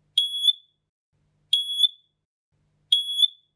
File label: Alarm 3x, rauchmelder